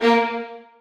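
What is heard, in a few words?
2nd violins staccato a#3; Sonatina Symphonic Orchestra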